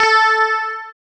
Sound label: GUI, E-Guitar